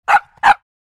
Small dog growly barking barks

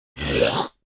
Male clearing his throat

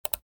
Cute click

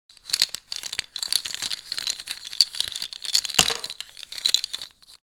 Plastic toy handling objects movements; gfxsounds

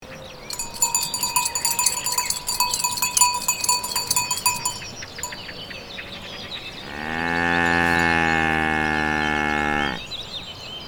Farm cattle mooing ambience loop

